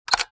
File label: Professional camera shutter click